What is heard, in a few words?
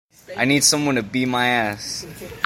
beat